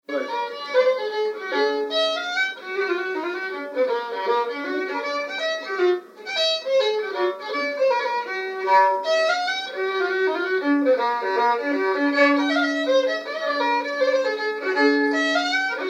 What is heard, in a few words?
hornpipe; Joe Cooleys